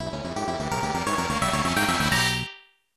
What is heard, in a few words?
Unused Jingle; Ninja Gaiden Trilogy